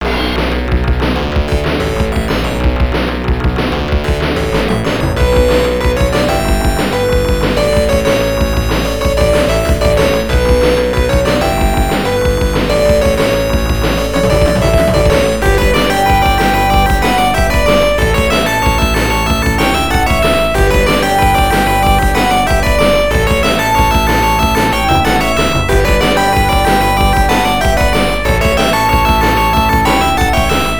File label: unused tune